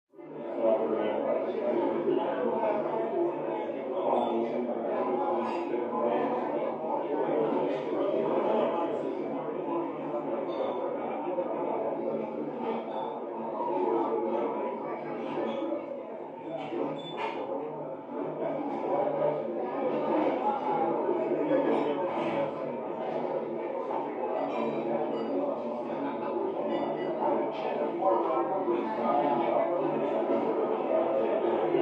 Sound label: Bar; Busy Adult Walla With Glasses Clinking, From Next Room.; bars & discos